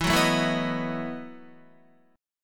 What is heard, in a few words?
E Major 7th Suspended 2nd Suspended 4th E, Guitar; strummed; 4F,4A,5C,5G,5C,6E; JGuitar